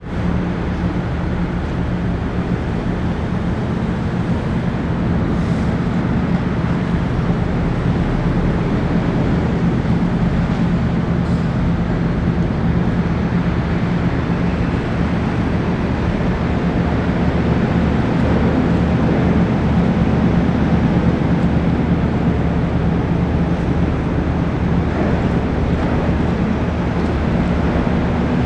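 ambience port dover uk cars leaving ferry in distance seagulls waves lap on rocks near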